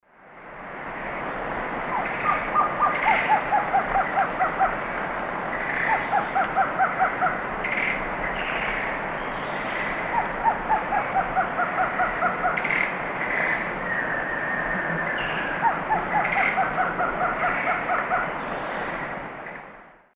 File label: Trogon viridis, song, Eastern Lowlands; S. America